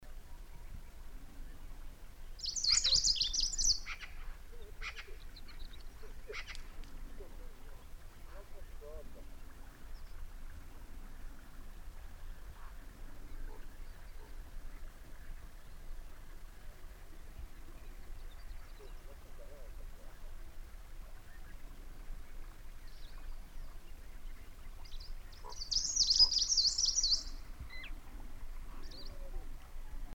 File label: phyplu song; Burjatianuunlintu (laulu ja kutsuääni); Laulu on vaihtelevampi kuin idänuunilinnulla ja sisältää usein toistuvia aiheita; Mongolia, toukokuu 2010